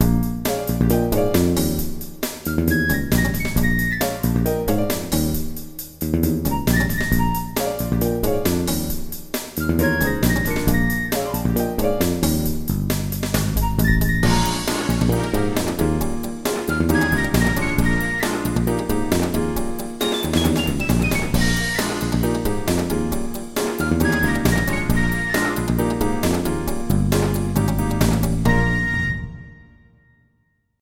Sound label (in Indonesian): Nada Dering Nokia Jadul; Blue Ice